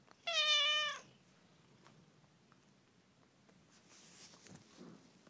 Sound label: meow